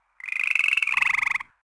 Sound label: rock frog; ambient; World of Tanks Blitz